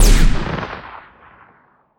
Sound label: Sci-Fi Weapons-Assault Rifle-Single Shot